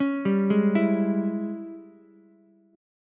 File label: G sharp Major 7th Suspended 4th Sharp 5th, Ukulele; JGuitar